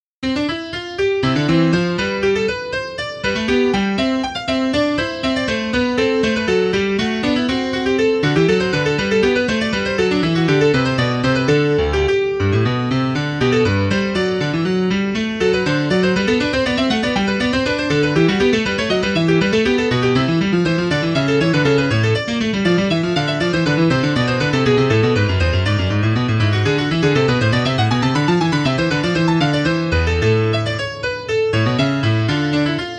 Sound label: baroque invention on piano